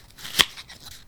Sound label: card Open Package, Casino; Kenney's Sound Pack